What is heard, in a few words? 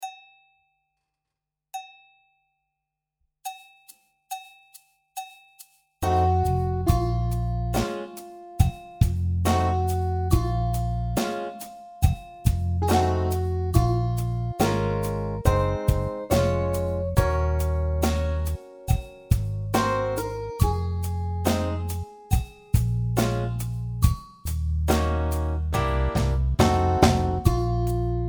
with drums